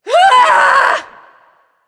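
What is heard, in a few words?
battlecry, female; smite; voicepack; hera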